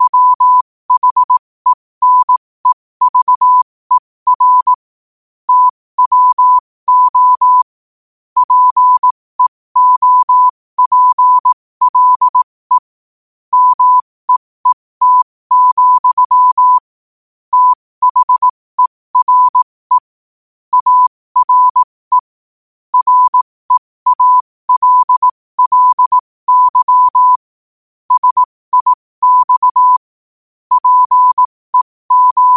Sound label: 5 wpm, morse